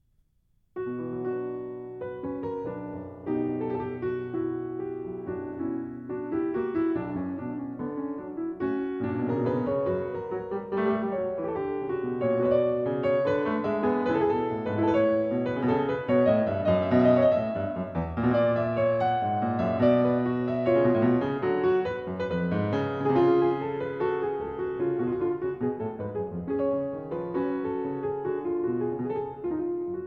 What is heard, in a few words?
French Overture In B Minor